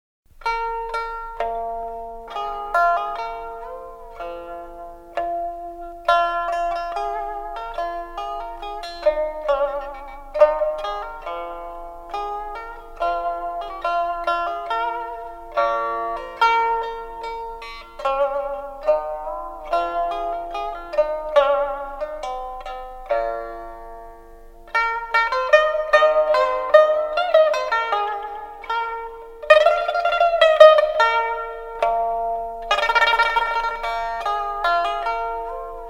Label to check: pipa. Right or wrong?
Right